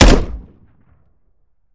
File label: weap fire; gmod; viper; weapons; scharlie